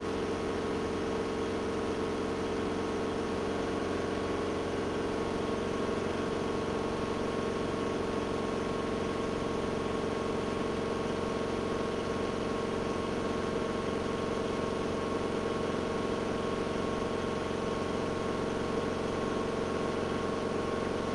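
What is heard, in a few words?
Robinson R44 onboard take off fly engine right; dpa4062; Sonniss GDC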